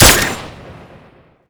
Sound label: claw shoot hard